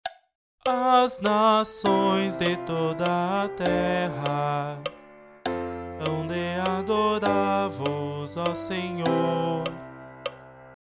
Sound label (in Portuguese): Coro Epifania do Senhor